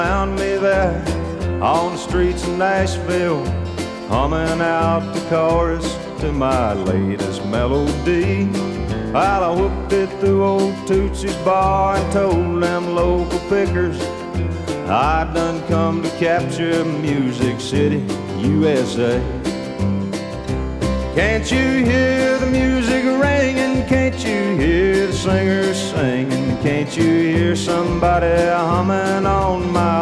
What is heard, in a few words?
country